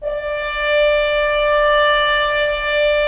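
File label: Solo French Horn, brass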